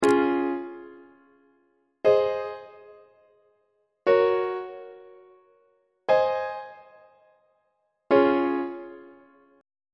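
c sharp major inverted imperfect authentic cadence; on treble clef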